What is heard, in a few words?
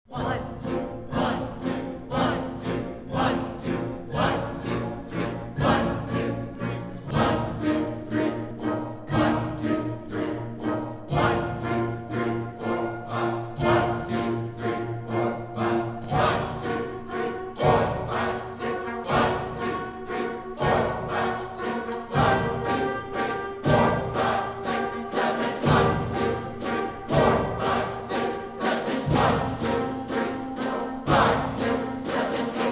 musicians only